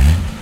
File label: car; RS Games Sounds; Monopoly